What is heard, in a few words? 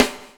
BUBBLE; Spectrasonics - Backbeat